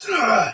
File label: Pain Soldier Sword Agent Male, Battle; Dialog; Barks; Melee; Sinecure